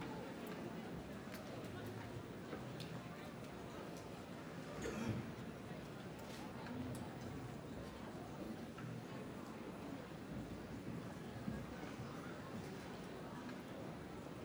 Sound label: crowd big loop; this is the president 2021 gamerip